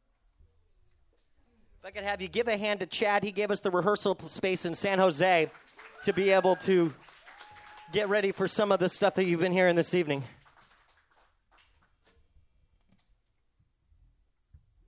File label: calls for a round of applause for Chad, who gave some of us a place to rehearse.